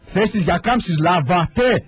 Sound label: military